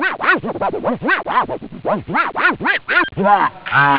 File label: scratch, rat; OctaMED SoundStudio V1